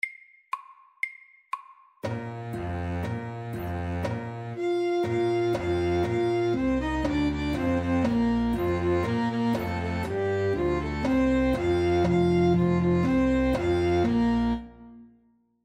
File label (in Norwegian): Folketone MIDDELS